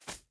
hare; fst animal; skyrim

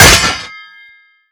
blade 2 hand parry

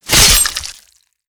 kill slash

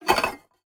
canopic jar open; skyrim

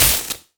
lightning impact

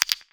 gem down; skyrim

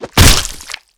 kill chop

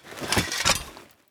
blunt 1 hand draw; equip

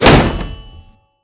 shield heavy bash

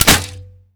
crossbow fire

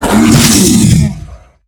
bear attack; npc

